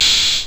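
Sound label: Closed Hi-Hat, Dance Drums; Best Service ProSamples vol.15; AKAI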